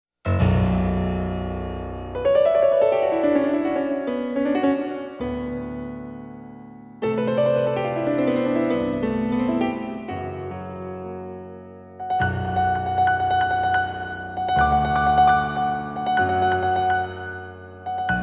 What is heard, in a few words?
Brazil